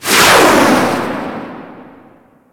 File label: Grineer Pursuit Space Ship Missile Proj By